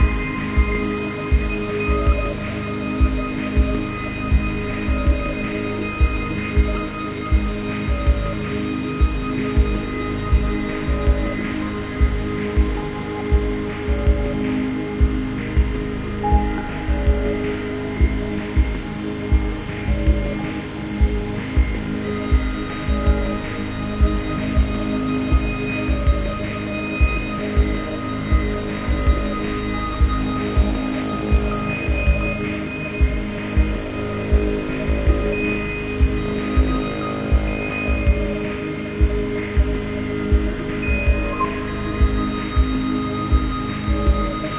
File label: ambience; Mirror's Edge (2008)